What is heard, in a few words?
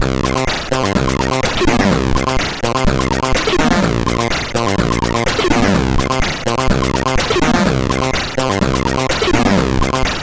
Hubbard Style Loop, sid CSG8580R5